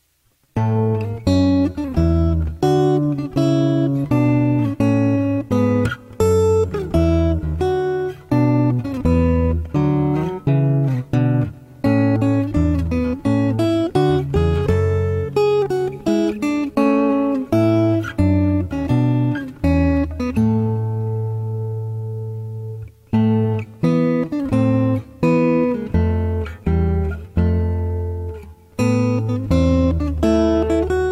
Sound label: Qaumi Tarana Acoustic Instrumental